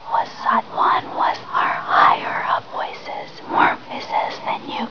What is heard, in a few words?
female chant reverse